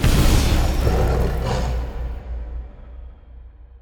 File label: wild Symbol Hit; netent